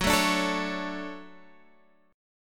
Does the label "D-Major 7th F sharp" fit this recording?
Yes